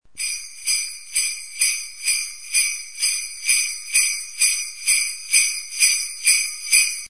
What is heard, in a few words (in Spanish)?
Campanas de trineo